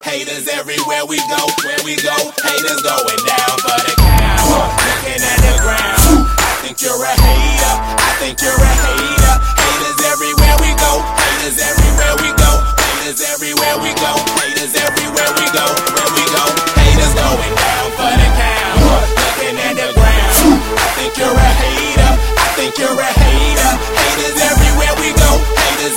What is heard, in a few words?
Hiphop Everywhere